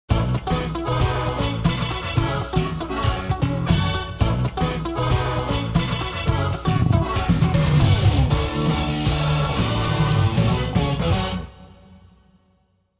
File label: Jingle, radio